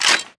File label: wpn arl reload; Titanfall 2